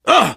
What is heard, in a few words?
reaction startled, player survivor voice biker; source engine; left 4 dead 2